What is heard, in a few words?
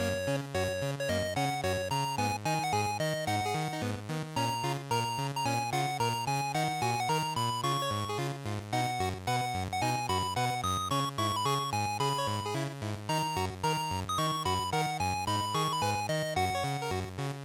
Title Loop, bgm